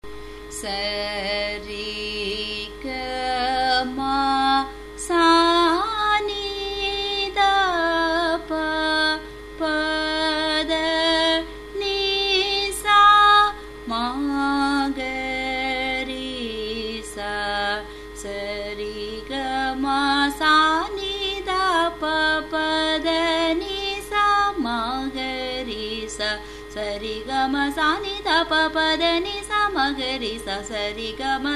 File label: Dhatu Varise, G sharp